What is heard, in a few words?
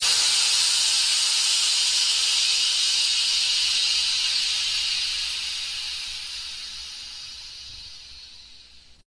release steam